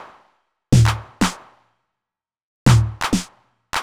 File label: drum loop scatter percussion 125, Classic Chicago House; Sample Magic